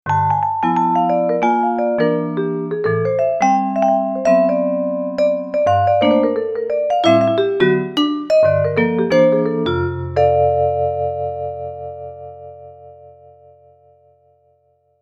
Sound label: Vibes; SP4; Perc